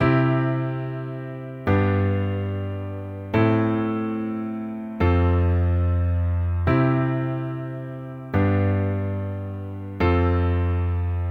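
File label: position mix with left hand, accompaniment both hands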